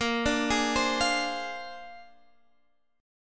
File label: Bb 9th Bb down Guitar Half Step Down